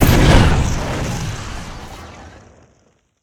flame burst

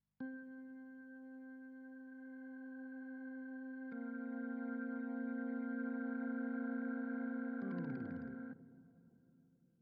Synths & Keys

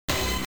orchestra hit, Amiga

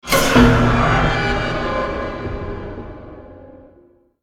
Stinger Action Short

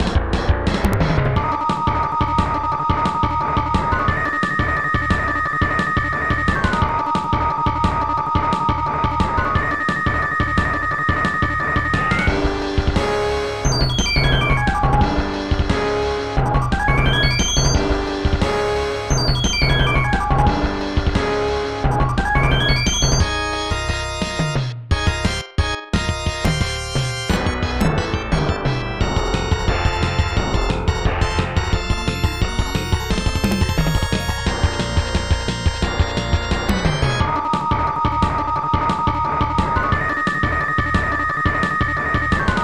Battle; Dark Law Meaning of Death; SNES

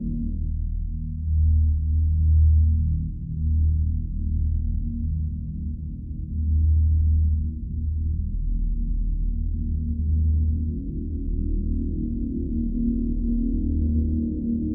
K.I.A Drone; Sniper Ghost Warrior 3 2017

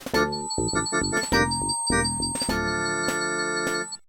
Death

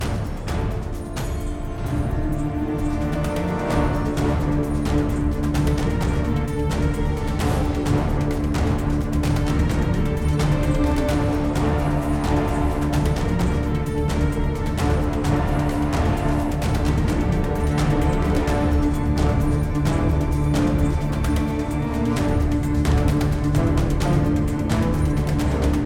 Boss Battle; DG Ancient Ruins

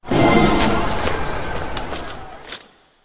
Complete Barrack

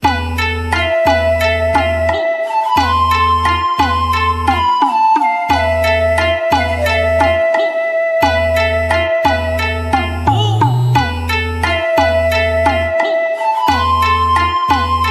Jingle Rpg Map

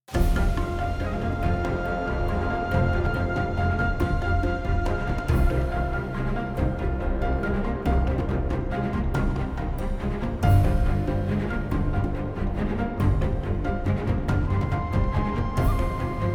Combat Room Loop; wellspring Combat Room2

